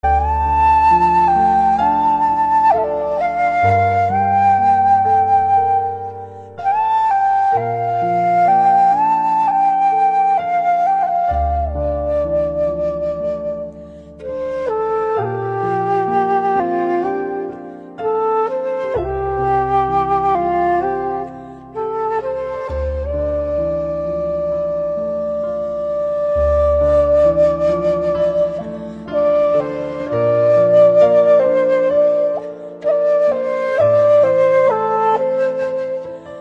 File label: Bamboo Flute; tono de llamada